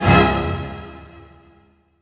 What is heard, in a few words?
hit, orchestral; Orchestral Loops